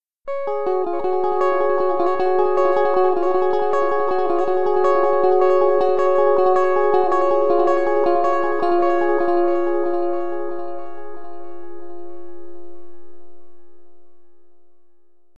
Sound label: delay